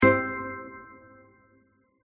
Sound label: Combo; gardenbloom